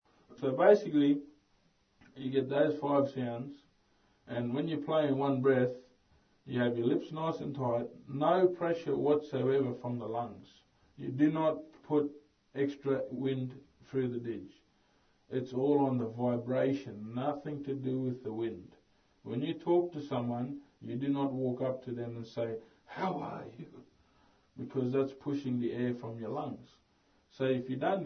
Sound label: Didgeridoo teach